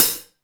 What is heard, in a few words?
HIHATS